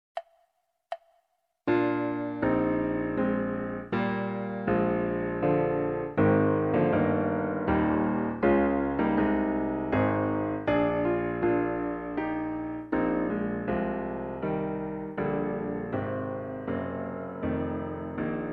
clarinet sonata; brahms

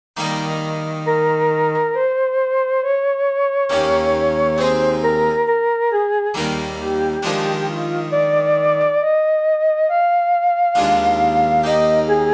bach trio sonata

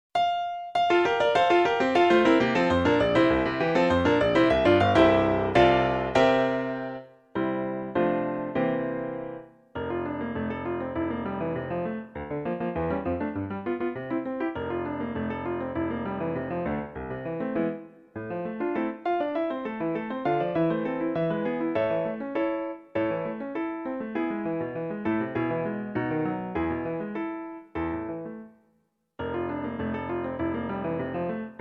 clarinet sonata; brahms

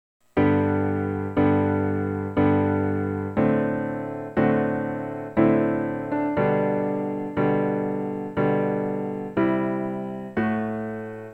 largo piano; handel

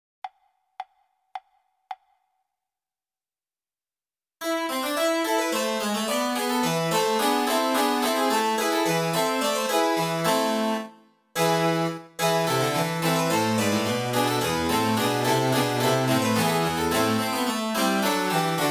oboe sonata a-moll; telemann

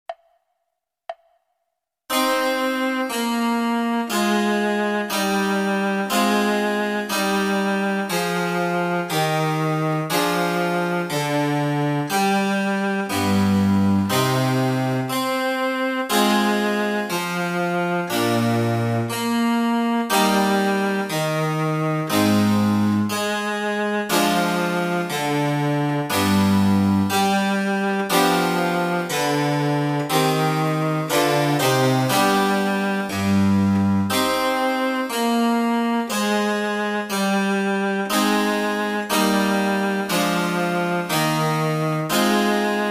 oboe sonata; handel; hwv366

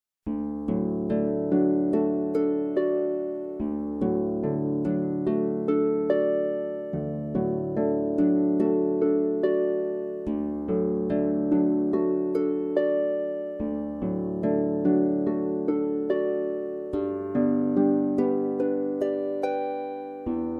meditation harp

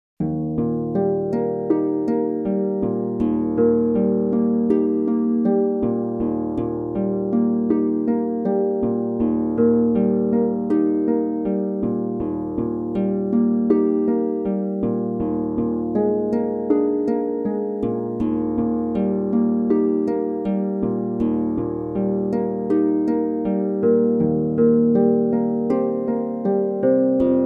intermezzo harp; de carmen

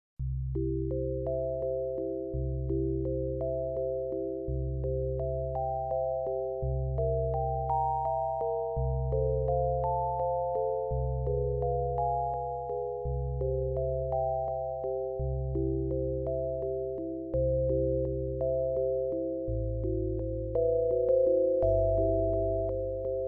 ave maria bell; schubert

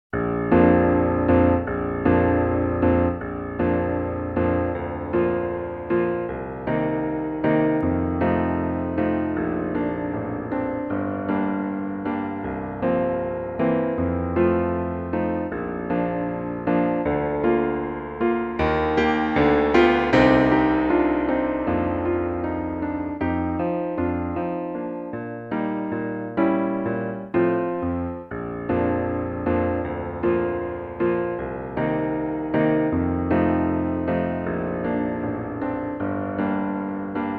salut d'amour clarinet; elgar

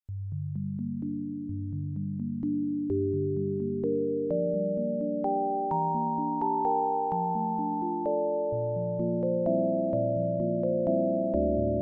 sicilienne bell

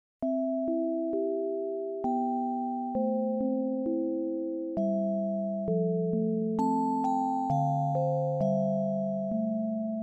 cavalleria bell

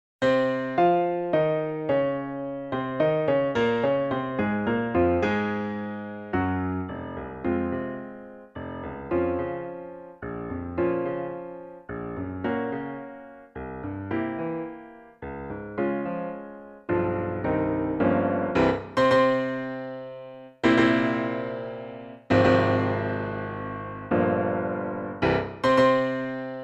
clarinet sonata; brahms

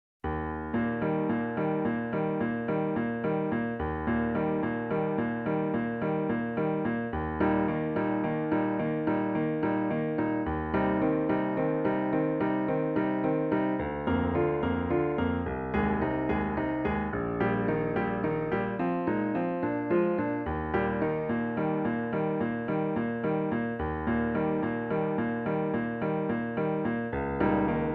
clarinet sonata; saint-saens

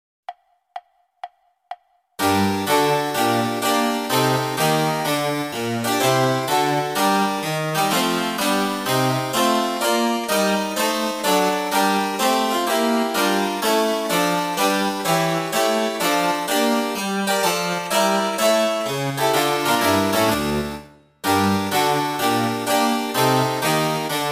oboe sonata; handel; hwv364a

